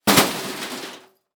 Crash Impact Car Crash Hitting Trash Can With Glass; crashes; AdobeCC